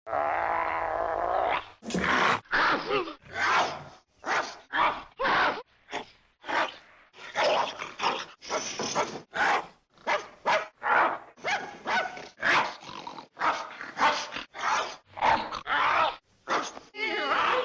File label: barking; curly